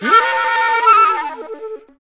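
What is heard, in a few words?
flutter, flute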